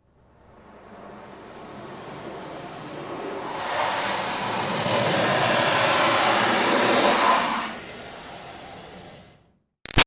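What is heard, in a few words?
Water Spray Interior Car By Soft Long; Car Wash; Spraying; Big Room Sound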